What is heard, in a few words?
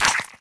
Steps